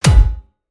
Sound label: Metallic Thump; lancer weapon fx